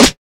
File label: airy sizzle acoustic snare sample c key